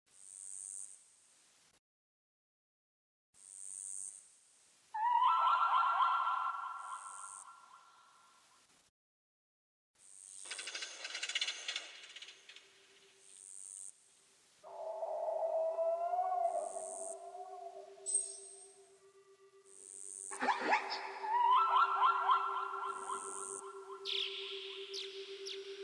ambient with nature; urodzilem sie by zyc

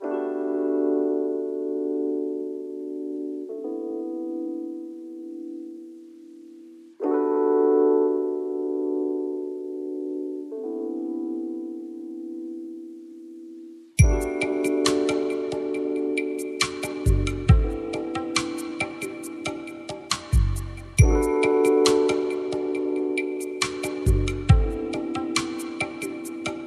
night lounge 432 HZ

